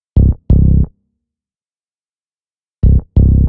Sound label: Chorus Finger, rap